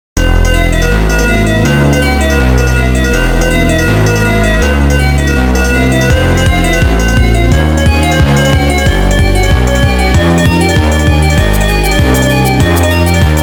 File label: nightcore speed up